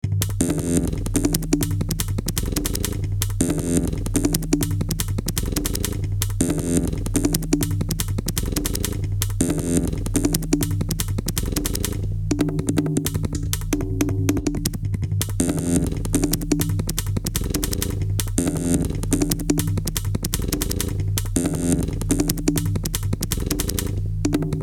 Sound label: Hand Perc; Batik